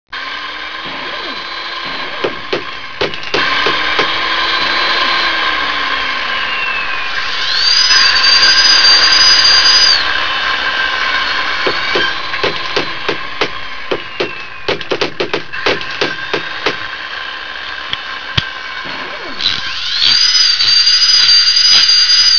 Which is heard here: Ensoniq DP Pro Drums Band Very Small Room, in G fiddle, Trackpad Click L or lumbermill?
lumbermill